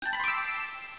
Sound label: Treasure Appear; Bob the Fish 1.6